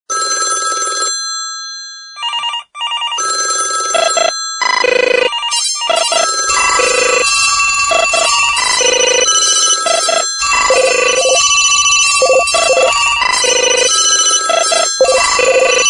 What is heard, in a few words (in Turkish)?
eski telefon sesleri karma; Eski Telefon Sesleri (Karma 15sn.)